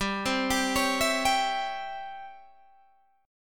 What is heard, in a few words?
Db Minor Major 7th Flat 5th G